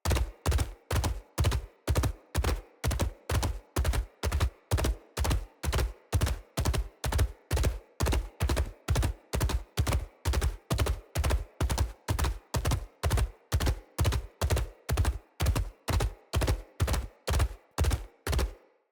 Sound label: Battle Dog Run Loop Default, Footsteps; Snc Battle Dog; Foley